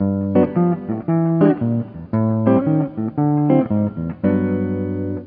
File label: Sound jazz bit